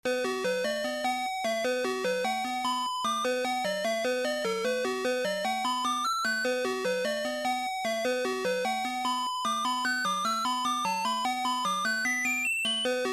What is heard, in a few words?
Tune A; Loopz